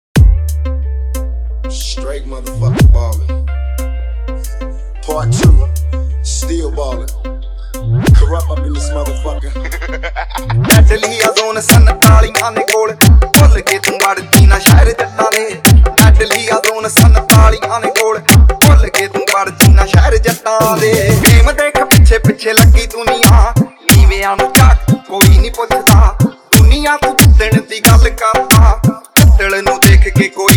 Dhol Remix Song

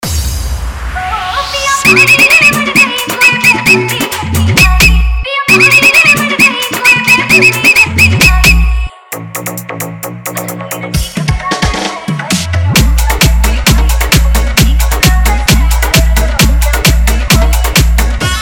Edm Vibration Remix Song

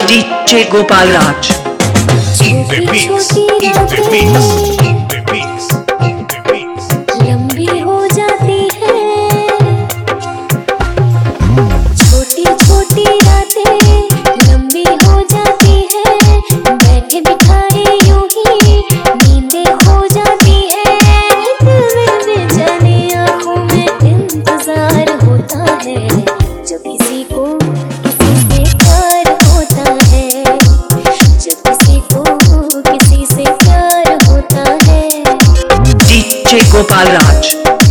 Hard Dholak Dholki Remix Song

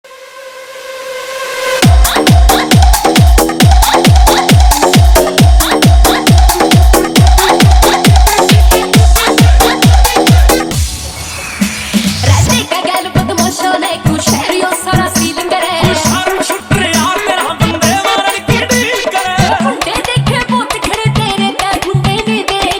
Dj Remix Hard Vibration Mix

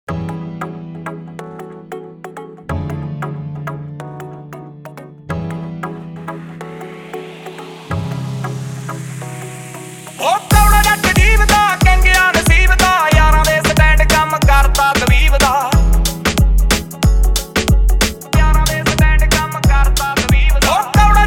Dhol Remix Song

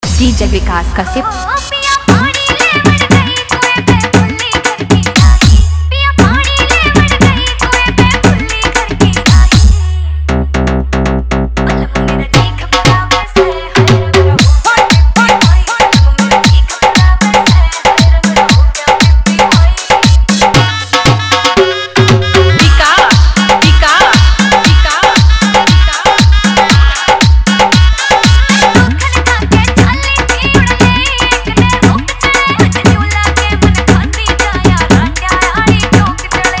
Hard Dholki Remix Song

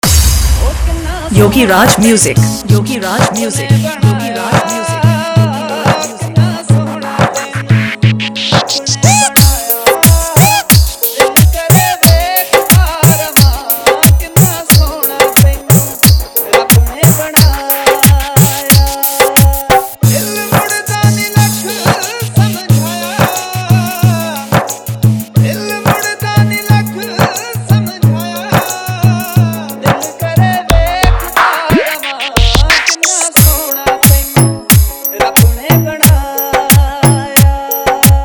Remix Hindi Romantic

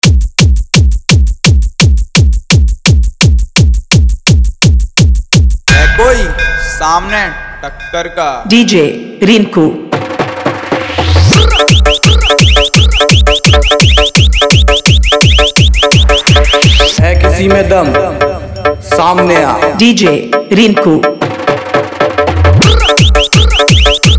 Sound Test Remix Competition Dj Song

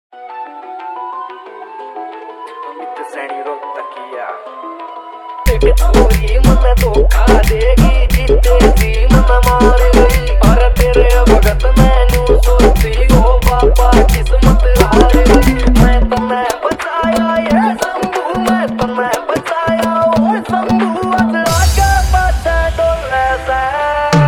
Full Remix Haryanvi Dj Song

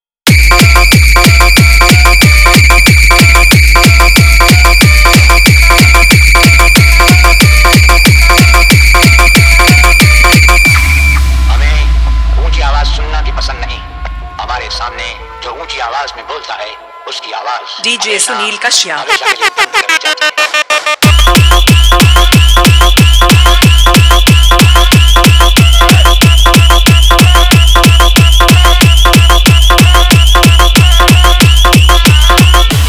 Sound Testing Full Setti Hard Bass Remix Song

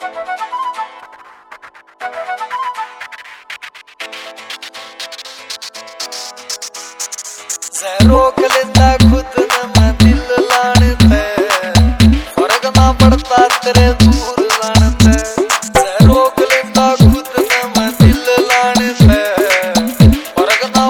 Hard Bass Remix Song

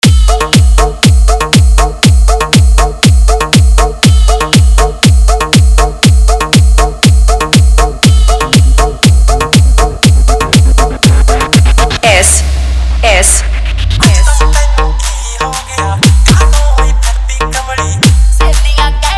Remix Song

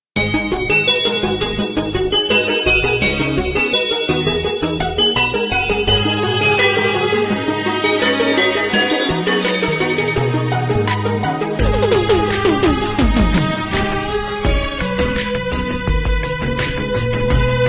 Punjabi Songs

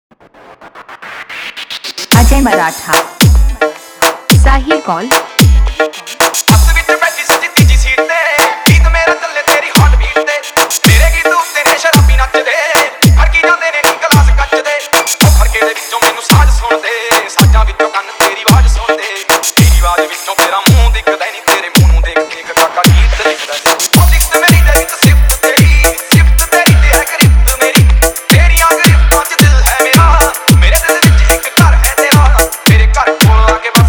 Hard Remix Punjabi Song

